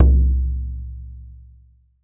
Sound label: FRAME DRU, FRAME DRUMS; Voice Crystal - Steve Reid Definitive Percussion